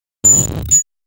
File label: video glitch